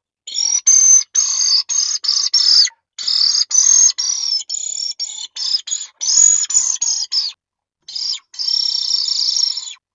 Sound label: Crying Bird; Nordik